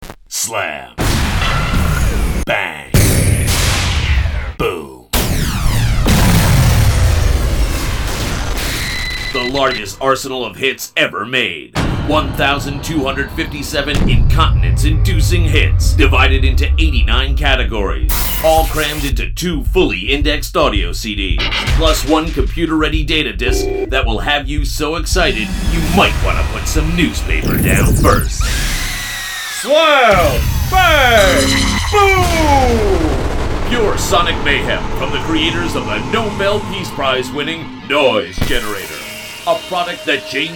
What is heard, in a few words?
Noise Generator